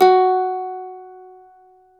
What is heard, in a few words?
MANDOLIN